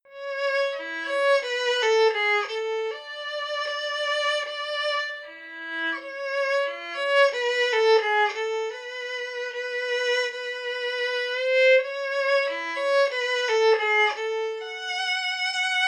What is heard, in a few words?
Violinist solo